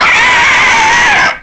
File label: Monster Bird Screech